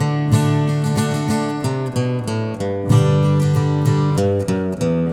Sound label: Country, Acoustic, Guitars; ACID MUSIC